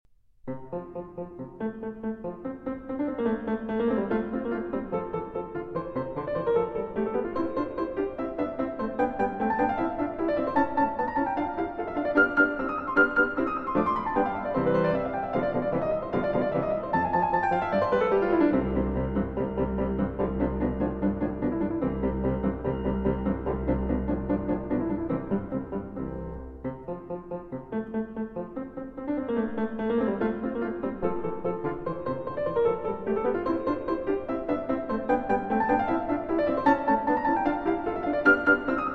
Piano Sonata No. 6 in F Major, Op. 10 No. 2 III. Presto